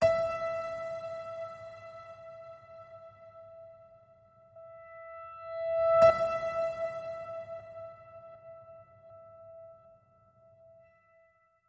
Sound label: Piano Note E, Alt High